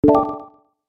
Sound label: video Pop Up